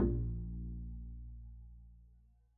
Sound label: pizz bb1, double bass; dsmolken